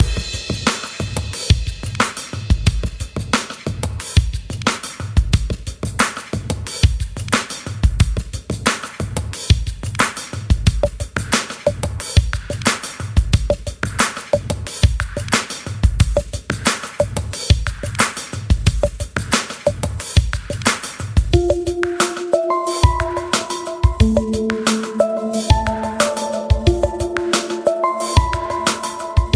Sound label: Ocean Lounge